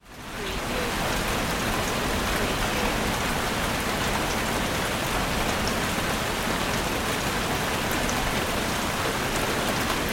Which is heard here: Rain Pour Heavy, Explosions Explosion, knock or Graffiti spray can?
Rain Pour Heavy